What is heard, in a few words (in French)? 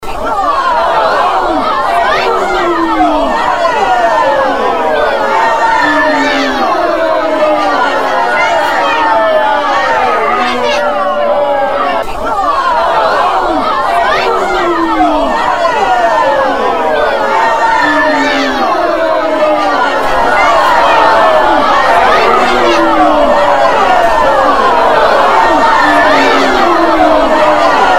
foule